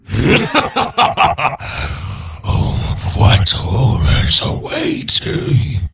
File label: Death; General Vezax